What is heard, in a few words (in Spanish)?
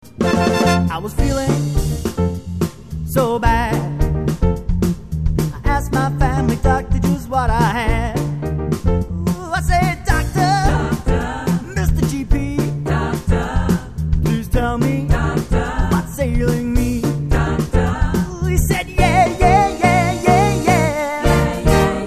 La Gran Salsa